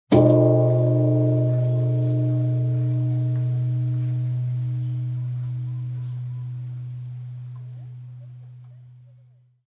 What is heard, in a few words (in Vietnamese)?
chuong nhat ban